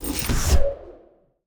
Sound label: ballista attack